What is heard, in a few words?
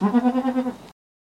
Goat Baah, Neigh, Muffled; goats